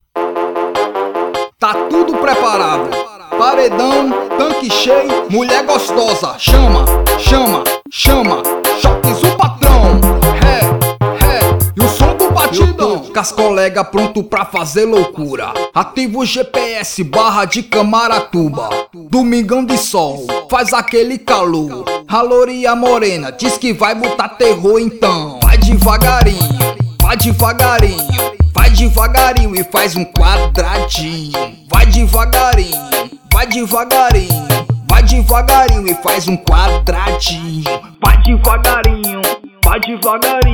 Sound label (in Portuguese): batidão; barra de camaratuba